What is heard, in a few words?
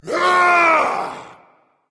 battlecry berserker; cuchulainn